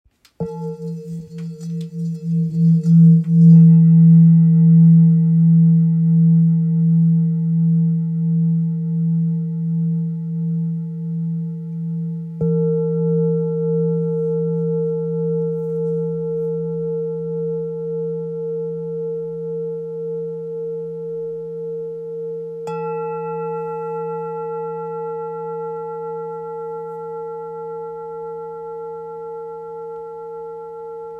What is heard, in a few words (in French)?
Bol ramgandi la diese; Bol chantant Ramgandi à Paroi Fine